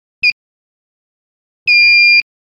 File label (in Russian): Блокирующий одиночный длинный